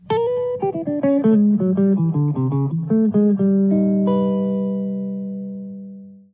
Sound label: Riff